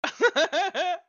bongo

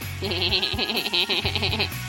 hehehe

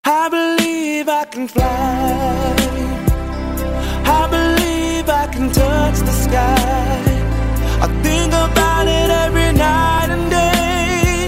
Chorus

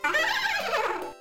horse

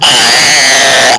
screams out

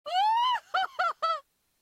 uhahaha

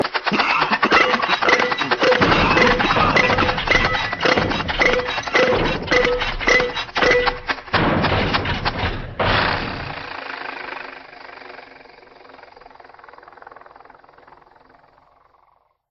plane sputters

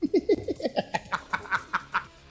cackles maniacally

